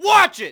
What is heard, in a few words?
yell; Matt Hoffman's Pro BMX 2; XBOX